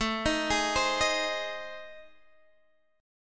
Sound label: A Minor Major 7th Flat 5th, Guitar DADGAD